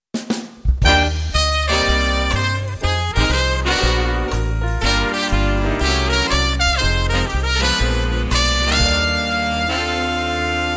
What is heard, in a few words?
Jazz Brass